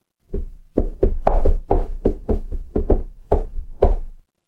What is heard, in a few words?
footsteps wood